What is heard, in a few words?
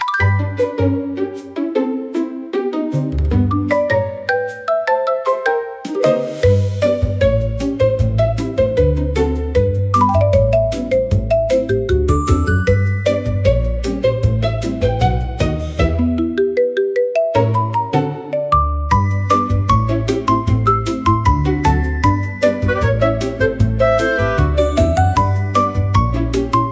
Papelitos y Marimba, comical marimba for funny scenes